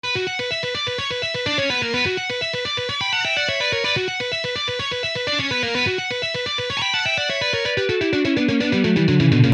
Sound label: Lick